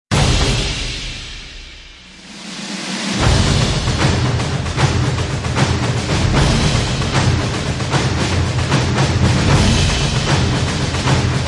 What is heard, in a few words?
Drums Only Version